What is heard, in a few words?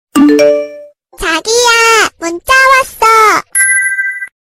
message tone; tiktok